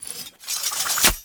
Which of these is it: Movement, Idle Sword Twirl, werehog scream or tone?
Idle Sword Twirl